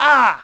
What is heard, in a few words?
Archer Hit; Sid Meier's Civilization 4 Complete; Warlords; Units